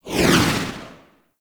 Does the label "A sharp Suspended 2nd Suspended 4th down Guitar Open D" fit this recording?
No